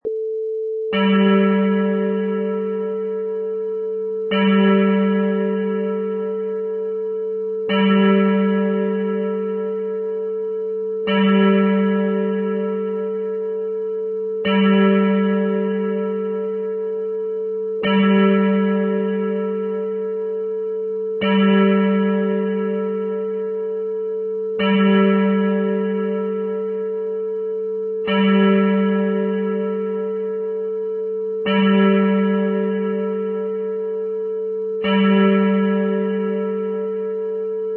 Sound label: Church Bell